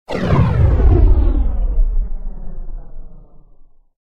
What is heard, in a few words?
Weapon Sci-Fi Firing; Hollywood Edge; sound designer's toolkit v2